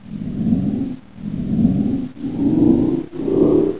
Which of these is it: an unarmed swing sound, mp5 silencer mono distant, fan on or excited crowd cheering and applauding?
fan on